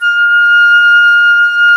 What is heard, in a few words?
FLT XFLT, Flutes; Club-50 - Foundations Roland